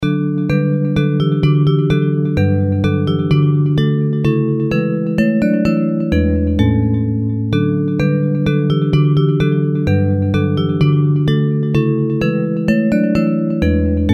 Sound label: Bells